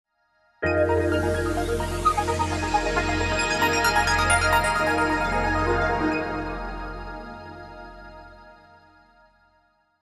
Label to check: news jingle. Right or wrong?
Right